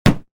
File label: cardboard box hit